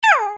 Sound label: ouch; slime rancher